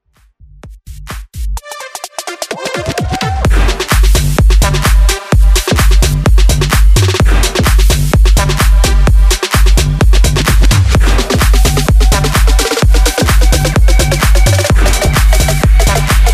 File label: Bass House; remixplanet